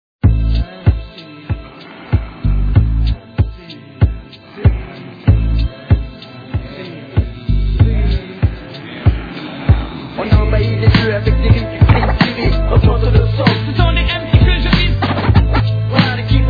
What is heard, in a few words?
hip-hop